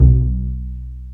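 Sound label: String, contrabass pizzicato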